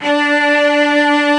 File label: Arco Celli D3